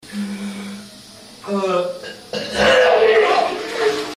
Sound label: hard puke